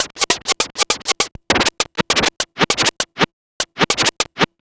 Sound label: Vinyl Scratch Snare; Digidesign; Pro Tools; Pro Tools Essential Loops; Dance DJ